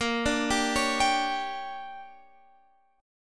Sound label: D Major 7th Suspended 4th Sharp 5th